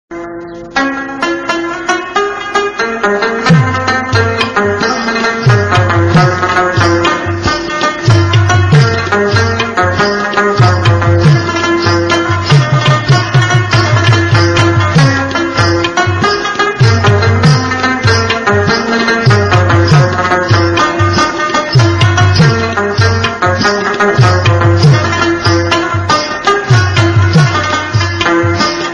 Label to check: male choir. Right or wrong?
Wrong